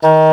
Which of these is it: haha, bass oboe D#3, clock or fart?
bass oboe D#3